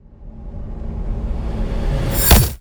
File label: Ice Spell Barrier Defense Ice Shield; Arctic Aura